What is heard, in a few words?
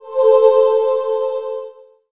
Sfx Yellow Heart; Levels Hub Bayou; Draw Leaflight